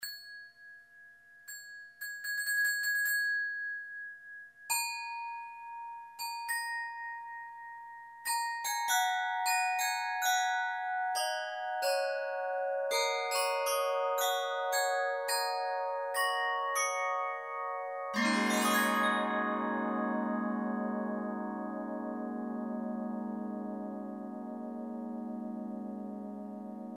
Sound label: Windchimes